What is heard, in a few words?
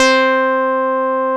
MC 202, BASSES